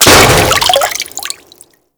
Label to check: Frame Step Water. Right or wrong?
Right